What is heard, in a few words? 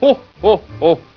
hohoho; soxmas